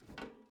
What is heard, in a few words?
metal jump